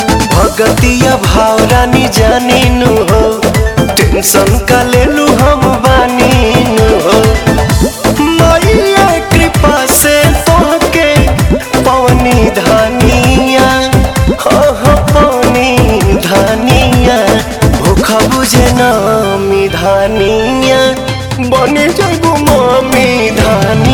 Bhakti

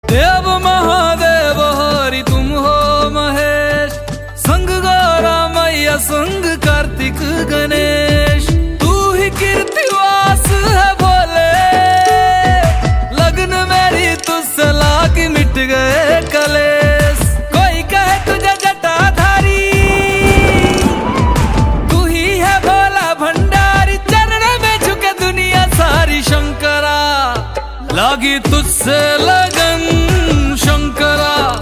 Bhakti Song

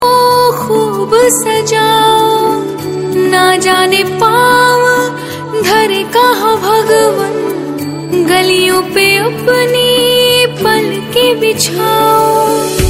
female version

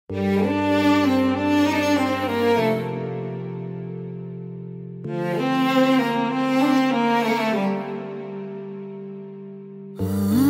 Sad Music

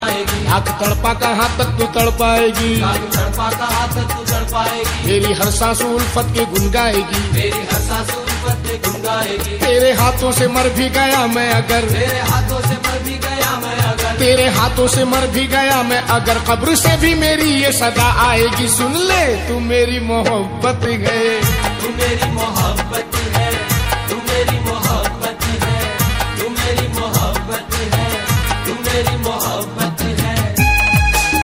sad song

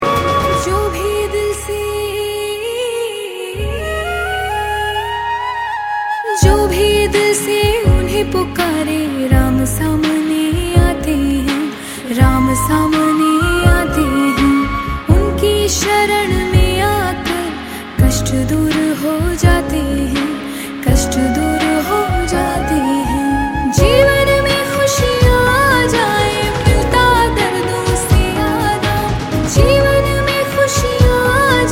bhajan ram dhun jai shree ram Bhajan

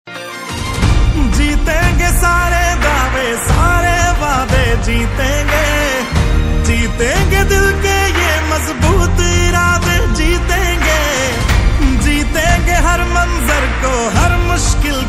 Song

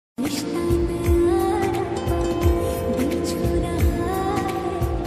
Love Ringtone, Tamil

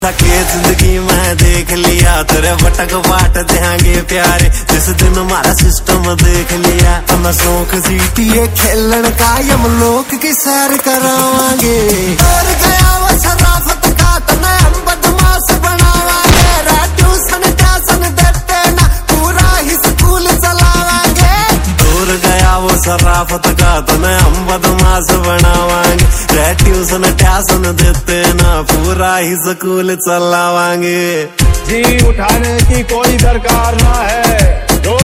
Haryanvi Song

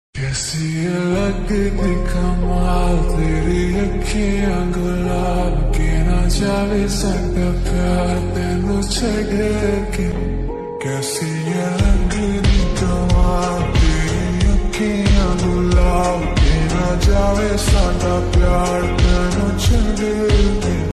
slowed reverb

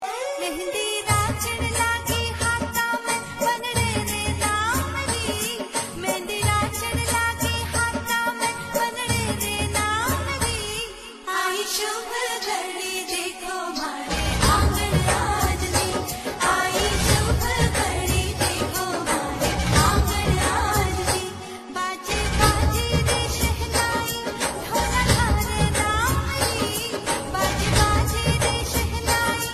Wedding Song

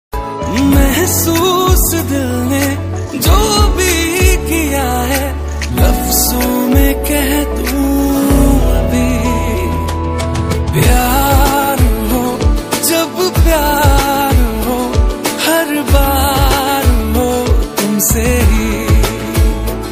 Slowed

